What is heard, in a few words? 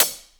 Real Closed Hats